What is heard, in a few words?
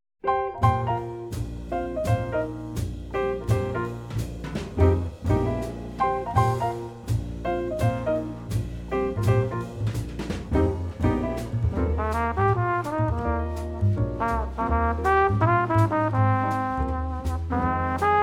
jazz ensemble; pierpont james; jingle bells